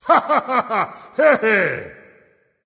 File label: nv yay; smite; voicepack; ymir